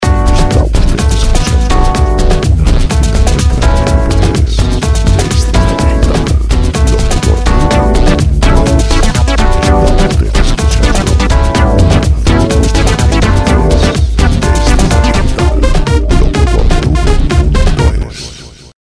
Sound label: 125 disco; dance music